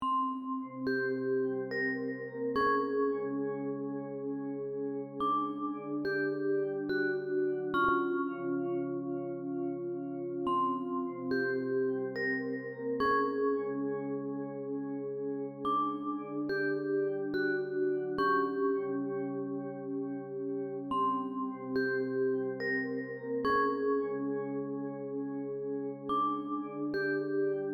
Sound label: Loop Bell